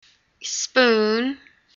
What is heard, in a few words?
spoon